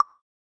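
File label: chat message in; Nokia N900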